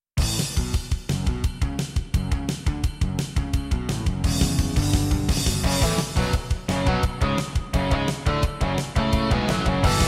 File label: Intro, Roland JV-1080; Duke Nukem 3D